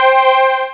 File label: Sweep; ActionCat